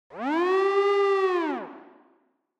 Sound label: Warning Siren, Fast Single